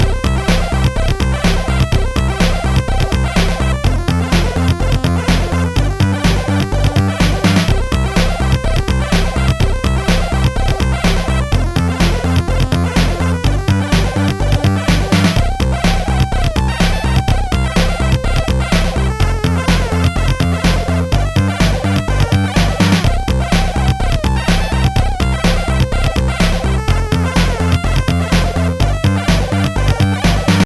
synthsong, chiptune

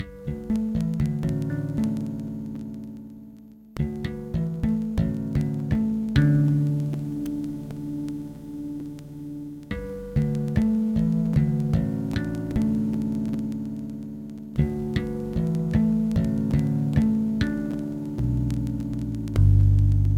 bass etude; Trackers; Modland

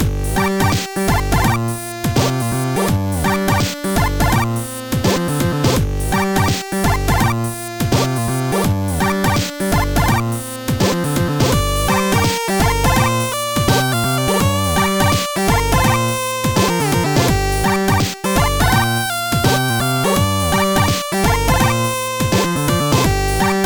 jazzy chip; Clawz; Trackers; Modland

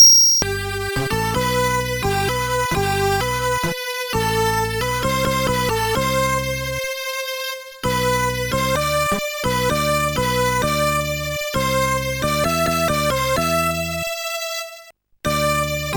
Music Box

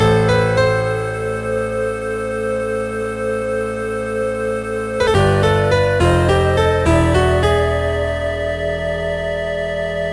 piano tune; MusicLine

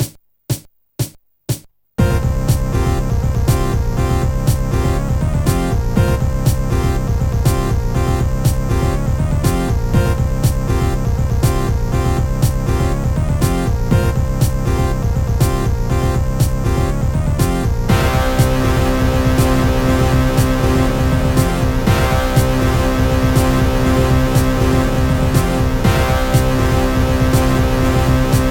tuning a harp; MED; Francesco Gambino

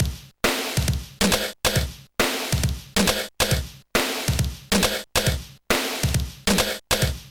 Dynamic Drums Pattern

